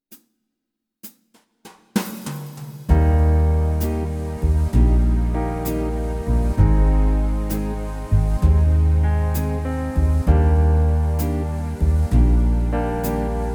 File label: Dreamy Ballad; genos houseband